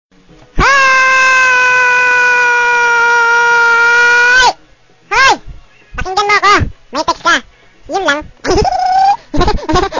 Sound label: hoooooooooooyy